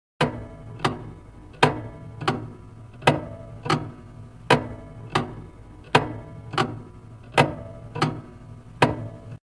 Case Clock; 100 sound effects

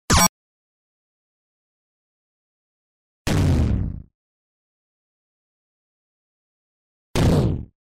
rumbling zaps; lazers

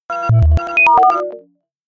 tones

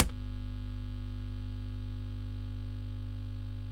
AMP HAM; 1000fx

